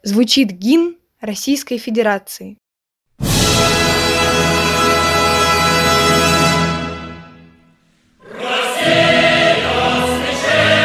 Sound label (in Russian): Девочка гимн РФ; гимны